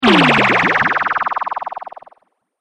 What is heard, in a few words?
Spell Timewarp Blast, magic sound effects